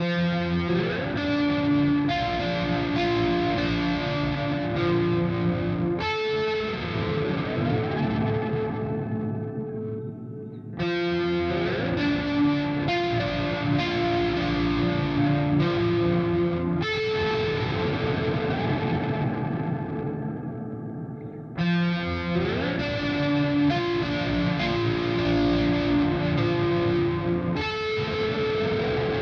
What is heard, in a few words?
Astral Destiny Sub; Earthquaker Devices